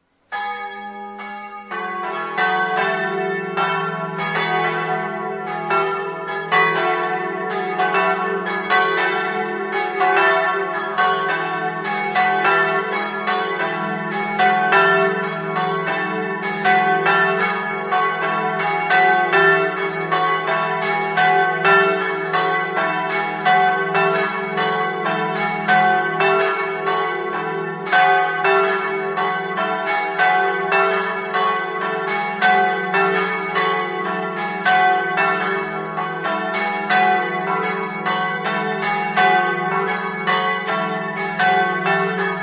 tramonti, campane

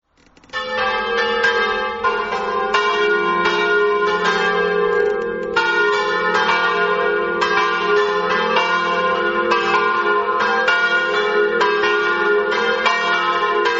campane, Mereto

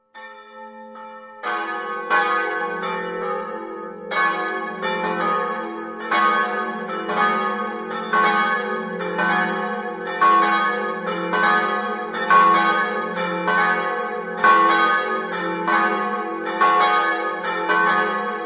smarti, campane

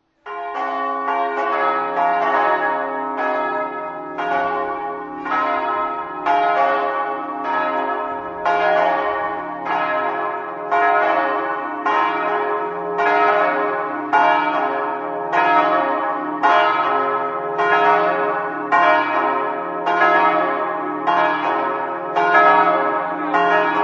ziracco, campane

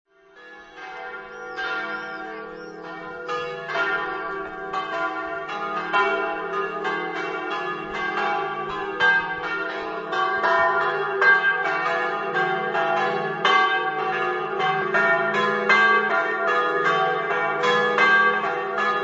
rigulat, campane

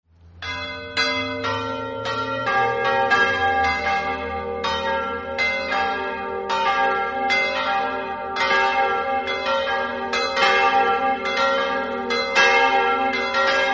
chiasiellis, campane

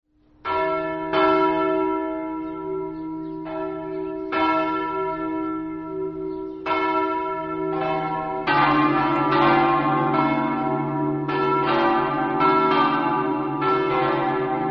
pavia, campane

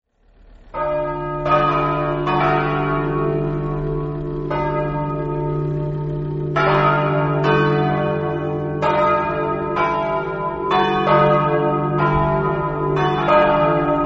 faedis, campane

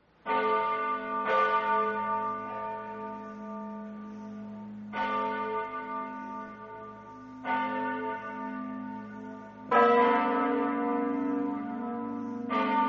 mortegliano, campane

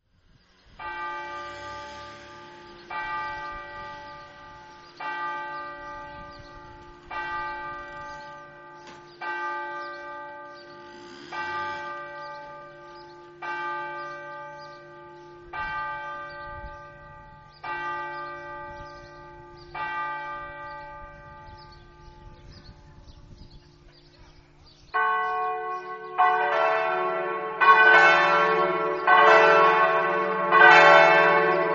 pasiano, campane

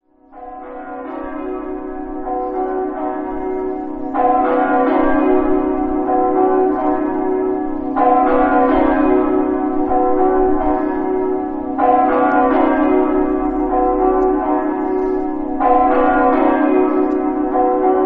liessa, campane

